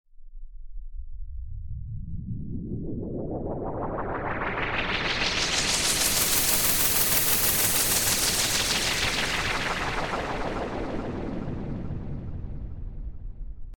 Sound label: Pink Noise Effix Delayed; Techno